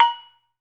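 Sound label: INDOBEL, Metalophones, Pitched Percussion; E-MU Producer Series Vol. 7 – Old World Instruments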